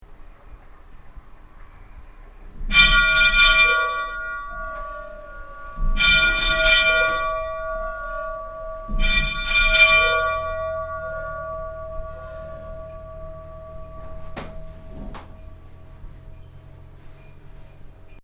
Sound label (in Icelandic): borgarkirkja staerri 3x3, kirkjuklukkur